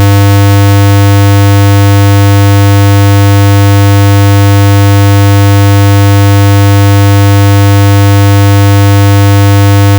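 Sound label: square 10Hz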